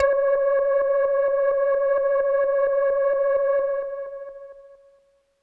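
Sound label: Leslie Kawaii C#3, organ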